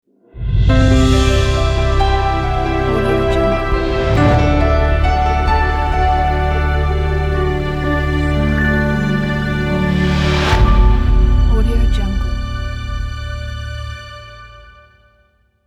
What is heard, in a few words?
elegant logo